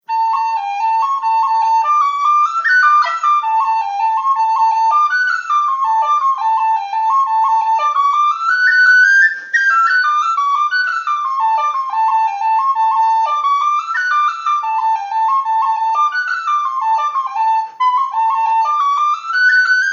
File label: Tin Whistle